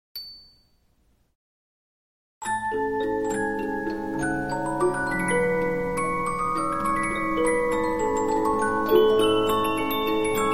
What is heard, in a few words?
Polyphon, 15inch disc musicbox